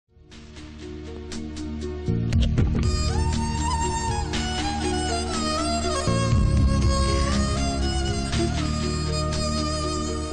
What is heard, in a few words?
emotional love bgm; pawan kalyan kushi